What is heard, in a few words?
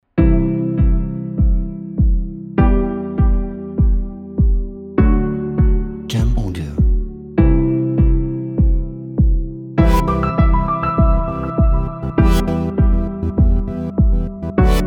Future Bass Uplifting